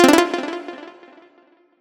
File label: combo; quatuor